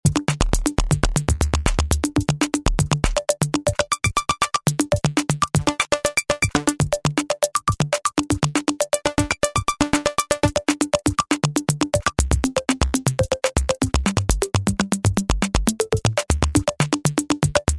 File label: Random EPop, Roland V-Synth